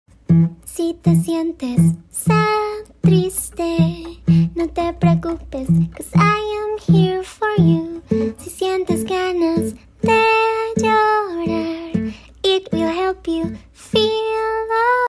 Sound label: Hello Hola sing along learning songs